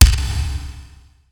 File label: kick